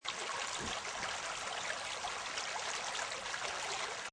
Water Brook Loop Short, Water stream, small brook running; Seamlessly looping sound, short version; River; Rivers; Lynne Music